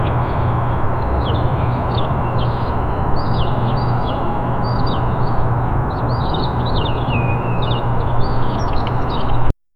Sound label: outdoor morning ambience, light breeze